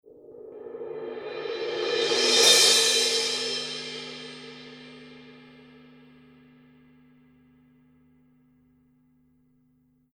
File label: color sound 900 19 black crash roll, paiste